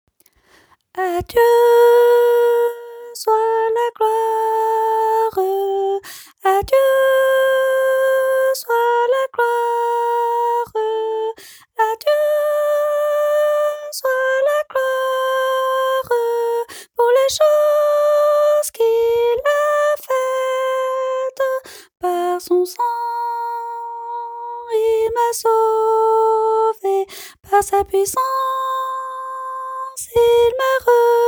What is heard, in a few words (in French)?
soprano